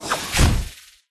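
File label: pterodactyl attack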